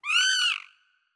Hawk; Legend of Keepers; Hawk Vo S F X

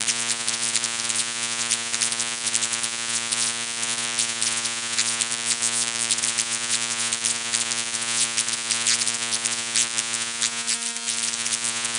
spinner Sparks Tone

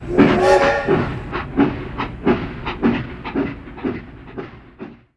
Train Whistle Chugging

